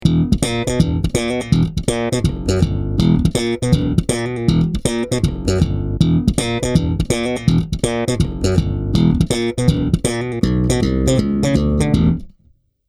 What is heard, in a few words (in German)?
Steg Slap